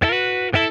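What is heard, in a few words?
D9 SLIDE, Funk Guitar; 90 s Sample CDs; Zero G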